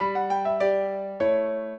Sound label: piano; Mozart; minuet